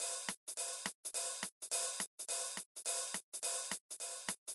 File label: Soundtrack